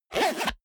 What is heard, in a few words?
zipper small case close fast